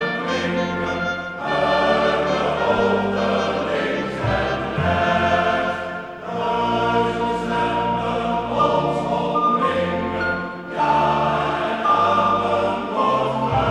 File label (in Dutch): Koor mannen; Veluws gereformeerd